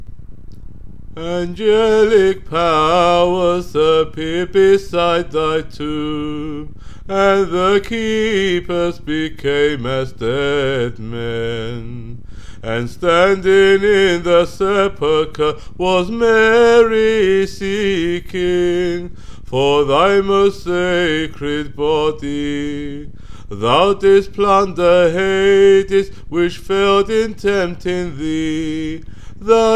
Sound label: VOICE Tone 6; Resurrection; Angelic powers